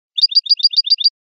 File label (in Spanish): bibleo acascabelado